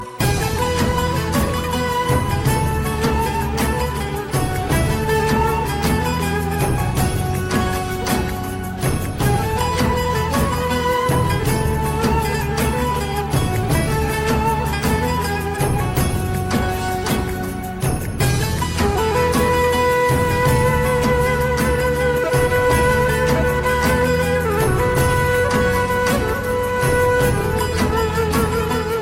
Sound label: Turkish Music